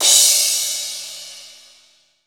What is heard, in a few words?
Cymbal One Shot; Drums